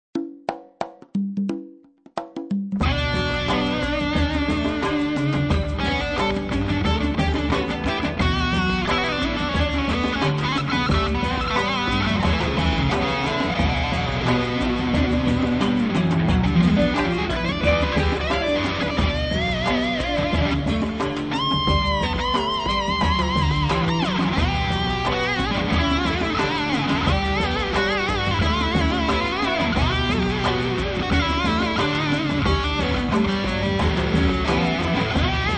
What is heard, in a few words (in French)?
Arpege